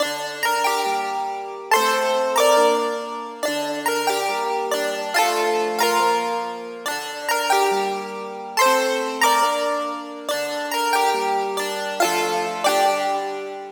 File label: Melody G 140 Chiptune, Melodic Loops, Color Bass; Ghosthack - Color Bass Essentials